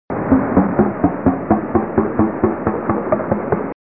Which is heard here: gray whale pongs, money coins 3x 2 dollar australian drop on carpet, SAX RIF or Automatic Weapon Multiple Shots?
gray whale pongs